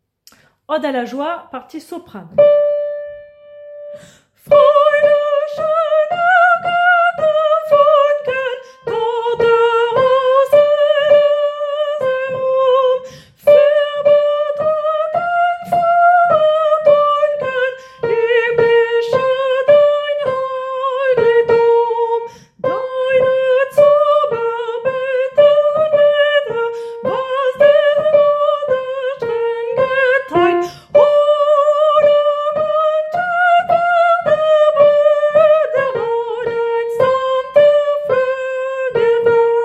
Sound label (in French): partie soprano